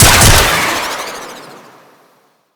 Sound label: Hand Rocket Launcher Shot; Weapons; Corpus; Crp Hand Rocket Launcher